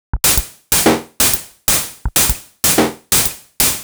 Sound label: 125bpm, House Drumloops; 90 s Sample CDs; Zero-G House Fabrik Drumloops; ZG Drumloops